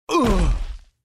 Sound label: male dead